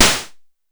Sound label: Snare, Pollard Syndrum